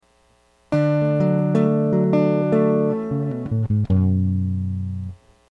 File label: rock